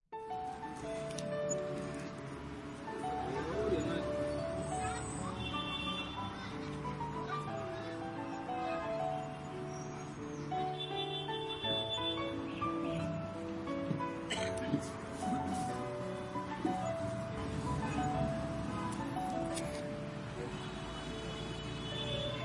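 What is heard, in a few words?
background music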